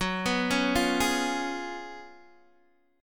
Gb Major 7th Suspended 2nd Suspended 4th, Guitar DADGAD